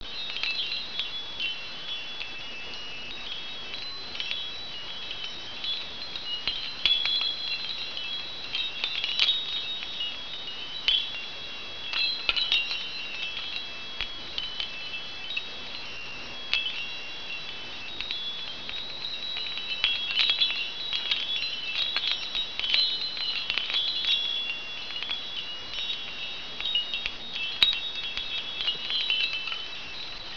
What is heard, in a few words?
bubenchiki, ambience; cstrike